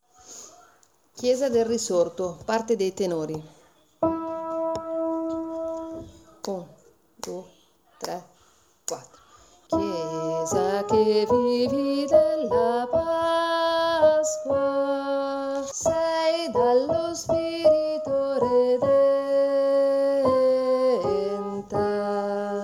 tenori

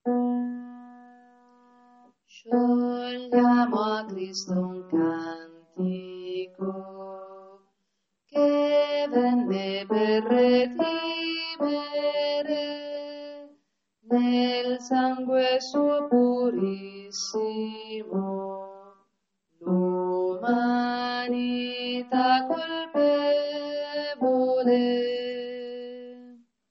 noi canteremo gloria a te tenori

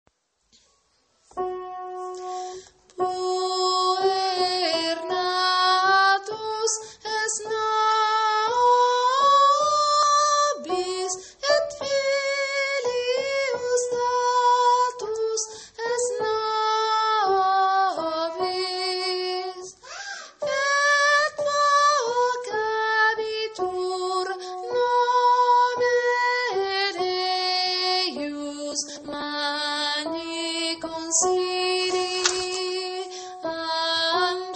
puer natus soprani

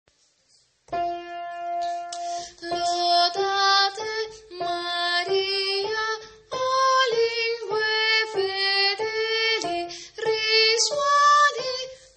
lodate maria soprani

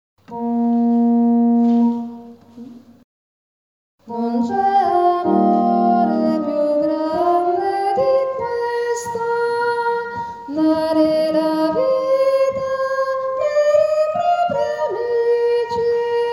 li amo fino alla fine soprani

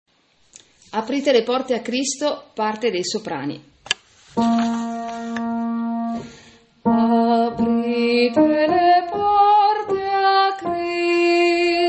aprite le porte a Cristo soprani

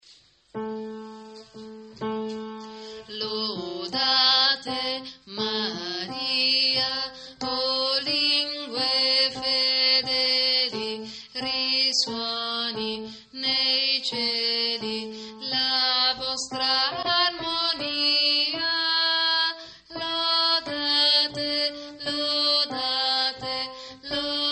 lodate maria tenori

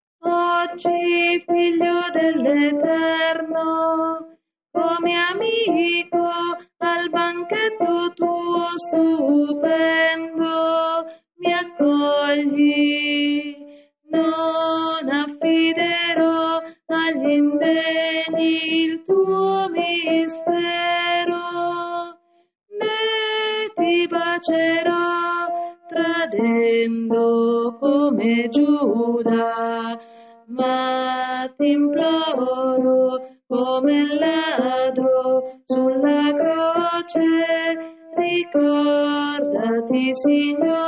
oggi figlio dell eterno contralti

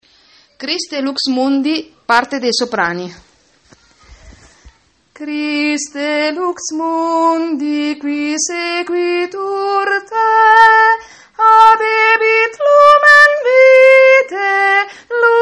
christe lux mundi soprani

